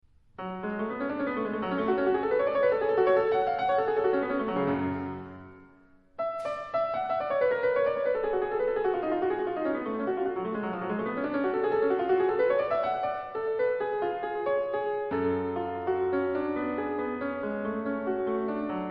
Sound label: Toccata; Brownell; BWV910